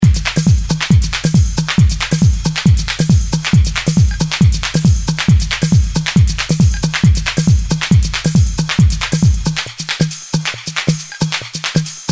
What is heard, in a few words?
Workout Drums